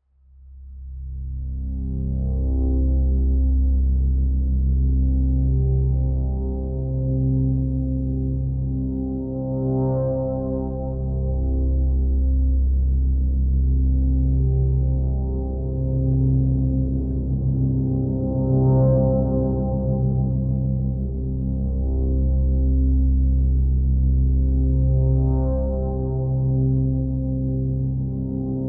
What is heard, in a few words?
Brassy Hammond Drone C